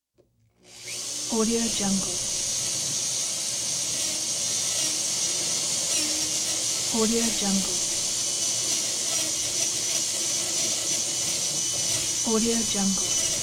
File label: drilling